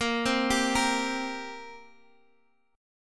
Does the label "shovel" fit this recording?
No